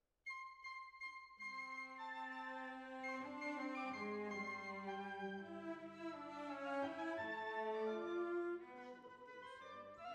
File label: Violas, jazz for piano